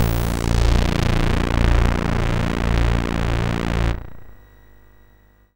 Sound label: SID Bass A#; CHIPSHOP HITS